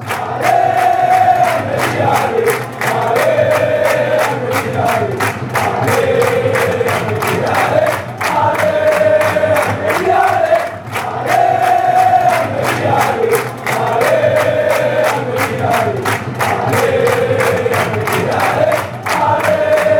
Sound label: ale almeria ale, fanchants